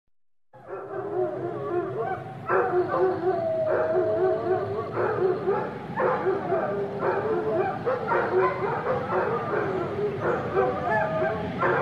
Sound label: dog boarding house; فضای داخلی پانسیون سگها